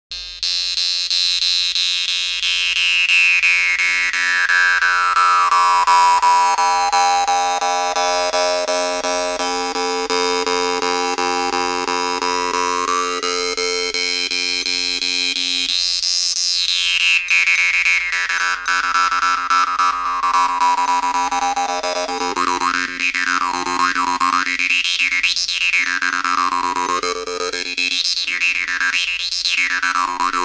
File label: improvisation, jews harp; Skif